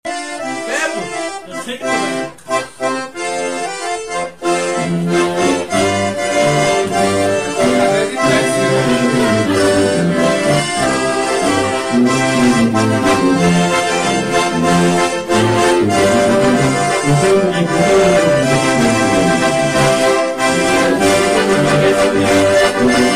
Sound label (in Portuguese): hino acordeon tuba; hino 39; Tocata Baixada